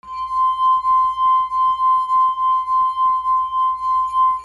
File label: MUSICAL